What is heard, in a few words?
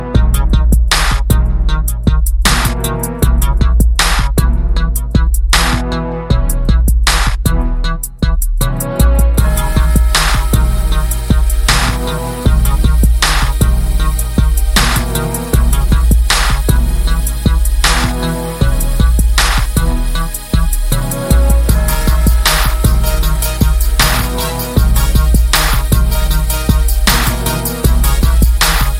Samsung Galaxy S5, ringtones